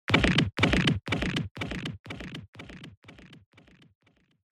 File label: Motion Fracture